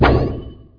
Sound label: disc bounce; hero alien